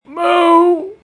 cow death

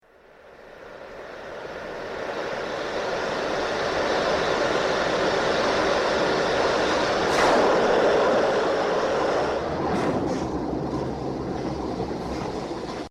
hiss and whoosh

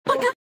speed up

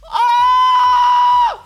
ahhhhhh; matoro

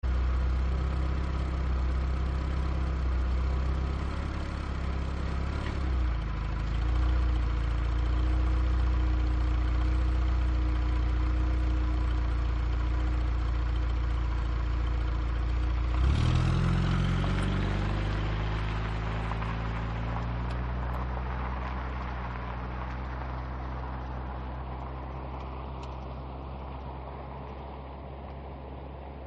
car engine running

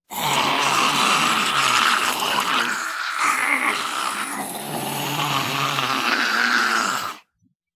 zombie growling

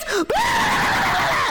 war cry; filian

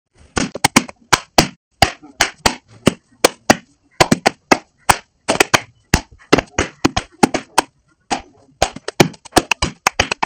fast horse tapping

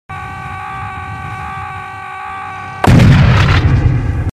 kamikaze scream; serious sam

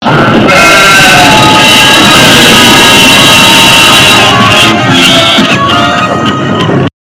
stress relieving asmr

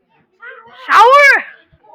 shower

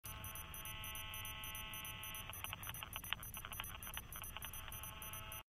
nitro cell no detonation; r6

